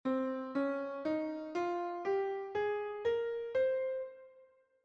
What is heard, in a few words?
Phrygian, mode, bells; Phygian mode